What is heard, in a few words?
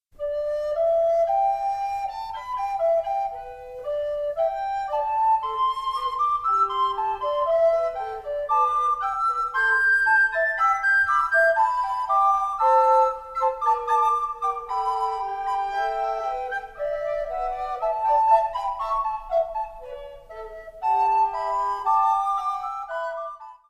Pentatonic Fughetta, recorder